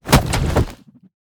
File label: pressurizer die